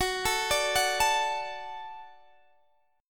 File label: Gb Minor Sharp 5th Gb Guitar Open D